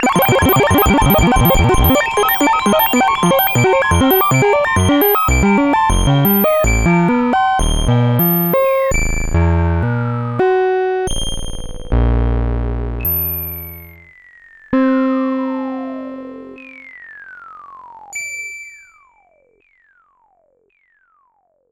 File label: FX, 5U Modular; Polyend; Simulacrum Sounds; 1 Shots; Synths